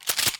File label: shotgun Reload End